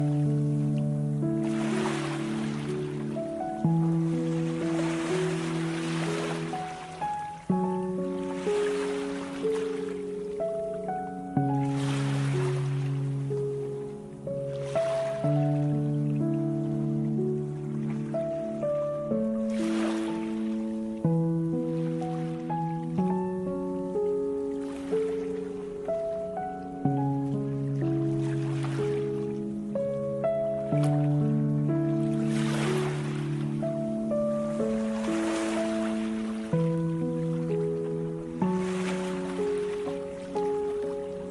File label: Relaxing Music with Ocean Waves; 5-Minute Timer; meditation